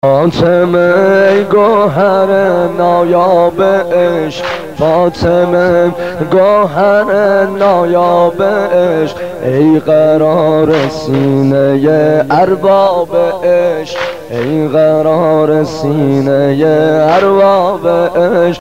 madahi ringtone

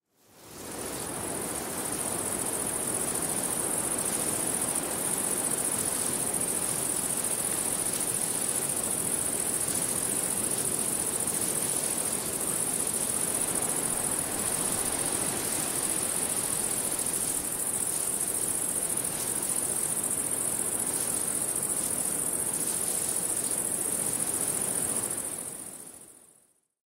Wheat Field

